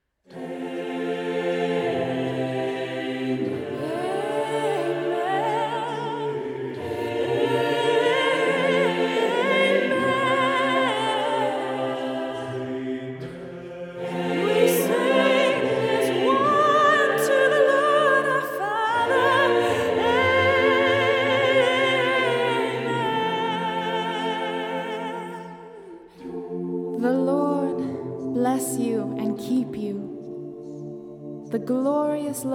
SATB Solo Sprecher; Lobet den Herren allezeit; Aaronic Blessing; L. Maierhofer

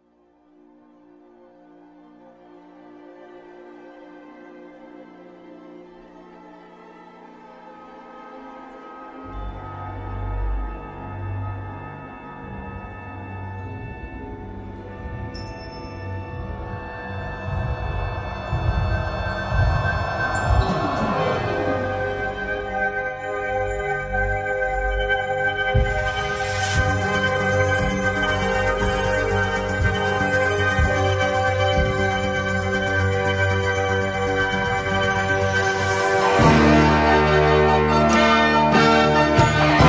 Logo Music

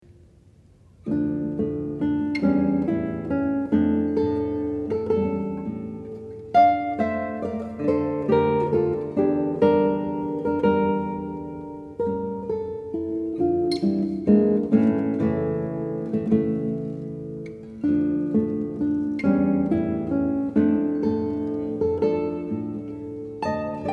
Tempo de Valse Lento

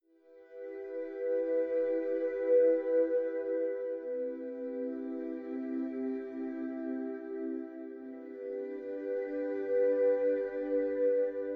Epic Trailer; Epic Fantasy Trailer

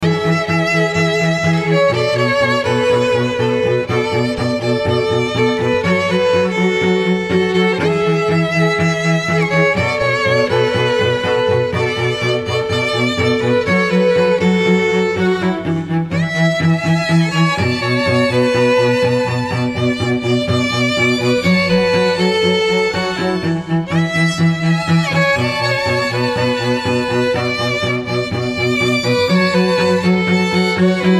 String Trio

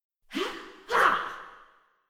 Princess Hit VOX

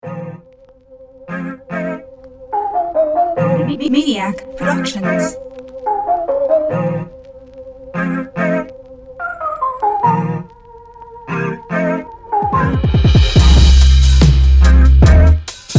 Bubbly

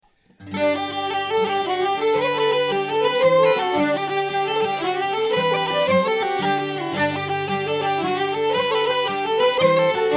open slip jigs @113

